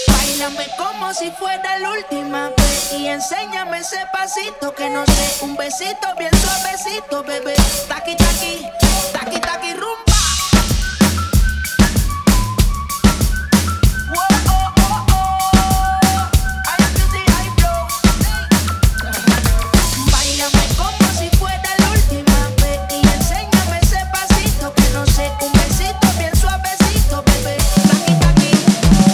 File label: Live Drumming